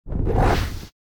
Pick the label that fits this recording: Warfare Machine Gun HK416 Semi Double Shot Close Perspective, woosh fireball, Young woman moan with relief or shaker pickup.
woosh fireball